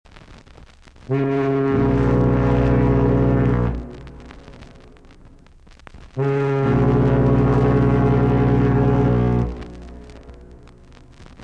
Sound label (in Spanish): barco